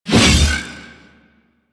Big Slash Whoosh A; Crp Big Slash

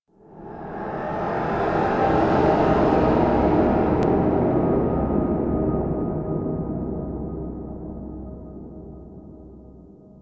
Mountain Pass Cave Stinger; Ambience; Warframe; The War Within

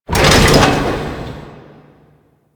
Skin Printing Mechanism Start; Ambience; Props; Warframe; Entrati

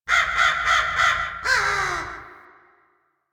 Ambient Bird Calls; Warframe; Duviri

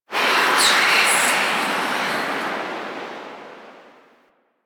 Tailor Shop Door Whispers; Warframe; Nineteen Ninety Nine

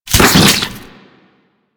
Venom Tick; Warframe; Saryn

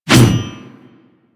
Mace Whoosh; Warframe

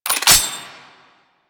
Spear Gun Reload; Weapons; Warframe; Tenno; Hoplite

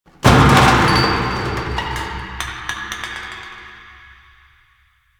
Subway Garbage Amb Misc; Warframe; NineteenNinetyNine

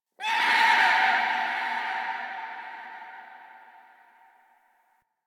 Interior Texture Painful Screech; Warframe; Infested Eris; Ambience

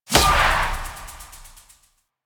dash start; Warframe; Infested; Zealoid Prelate

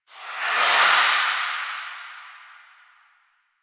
In Range; Jade Shadows; Birth Minigame

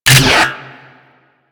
Reload, Weapons; Infested Tentacluster

